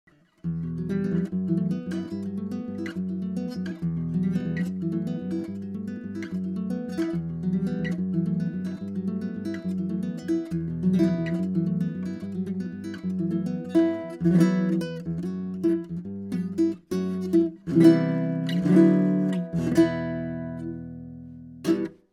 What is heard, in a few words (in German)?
Jazzy Mikro